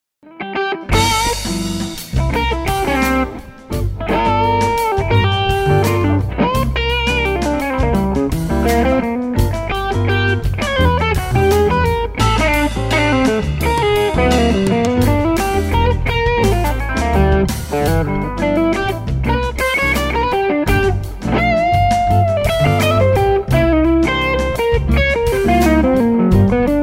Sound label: Blues in G